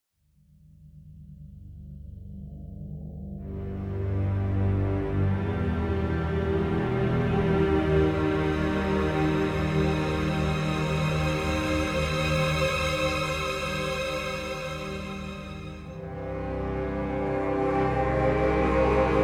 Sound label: String; Assassin's Creed Unity Dead Kings